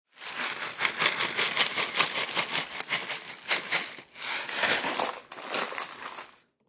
Shaking cereal into bowl; Kitchen; Household

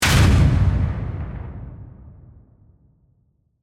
Hit Impact Blast, Heavy; Production Element, Imaging Element, Accent, Transition; Hit Sound Effects

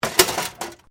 Metal Light Movement Minor; Materials

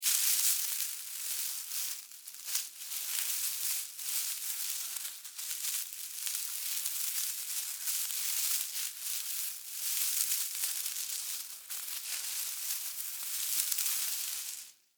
Animal Critter Movement Grass Foliage; Miscellaneous Animal

